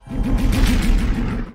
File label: racer engine; Star Wars; subulba